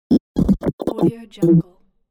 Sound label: robotic glitch effect